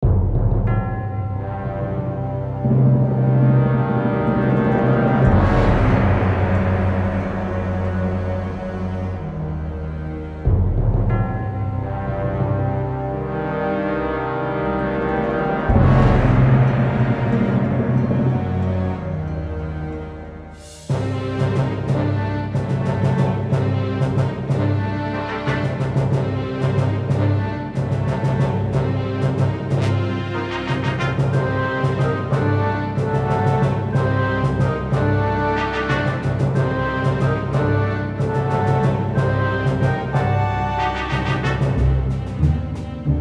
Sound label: Briefing Music - Allied